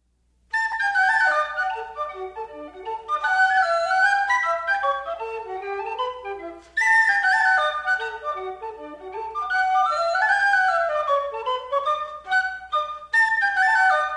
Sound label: sweeps Horn